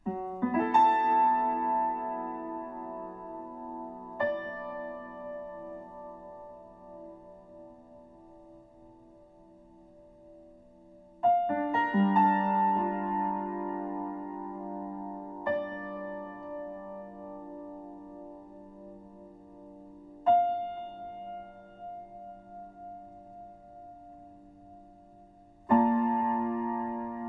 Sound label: Piano Cover; BOTW